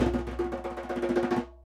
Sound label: conga licks